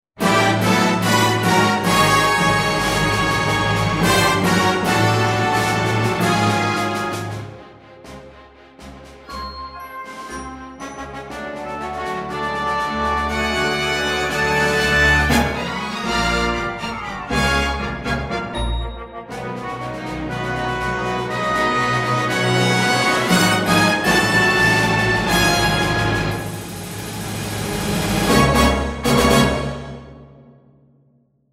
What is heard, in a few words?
Fanfare